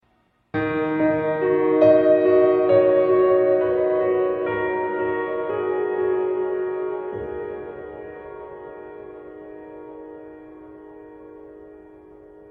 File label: sms; mass effect